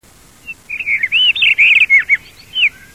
modrak - Monticola solitarius; ptak dotychczas w Polsce nieobserwowany; wroblowe; turdidae

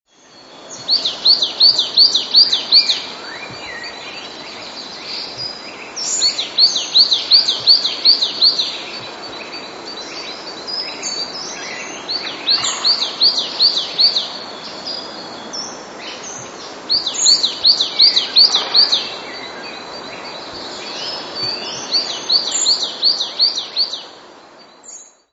uboga, śpiew prawdziwej sikory ubogiej; wroblowe; zapozyczenia

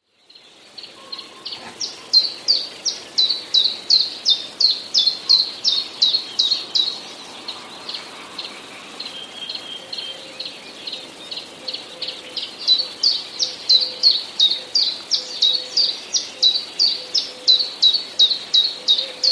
pierwiosnek, te zduszone, podwójne sylaby; Sobieszewie koło Gdańska 16 czerwca 2015 roku; pierwiosnek śpiewa

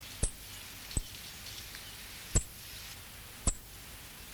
drozd śpiewak - nawoływanie; wroblowe; turdidae; turdus

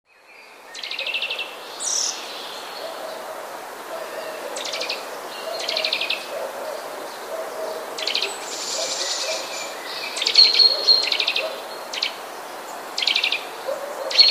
kowalik ciche tryle; W okresie godowym; który jest cichy i brzmi trochę szczebiotliwie

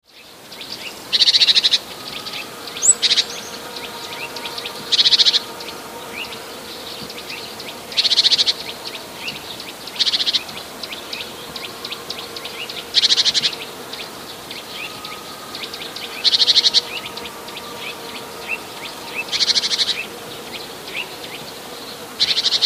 bogatka, głos niepokoju prawdziwej bogatki; wroblowe; zapozyczenia

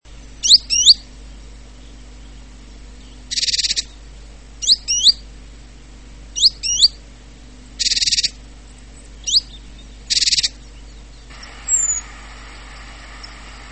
bogatka; wroblowe; sikorowate

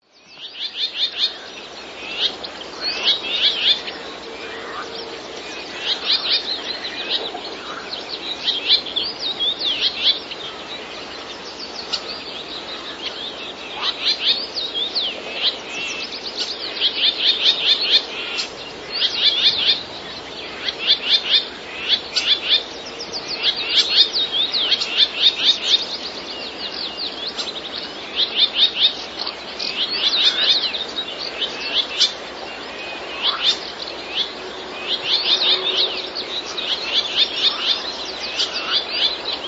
cierniowka, nawoływania prawdziwej cierniówki; wroblowe; zapozyczenia

